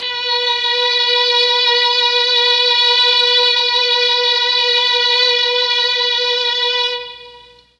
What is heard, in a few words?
B2 3 violins, mellotron MKII